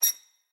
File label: sign squeek; the wolfs night